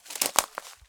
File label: Crunchy Step, outdoor, Footsteps